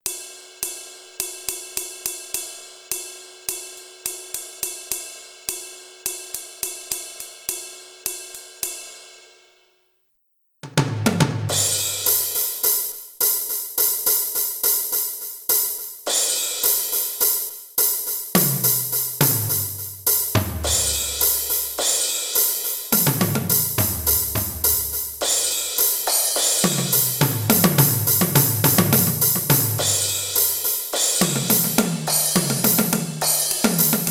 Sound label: music percussion; one living church